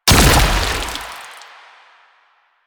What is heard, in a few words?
Grenade Explosion; Warframe; Lasrian; Lasrian Soldiers; Weapons; Lasrian Chem Nox